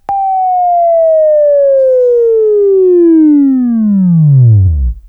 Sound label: WAV Left, Sweeping; Sweeping Training program